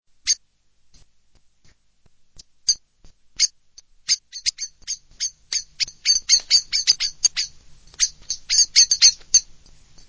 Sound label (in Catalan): merla